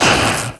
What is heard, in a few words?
blaster bang; Genesis3D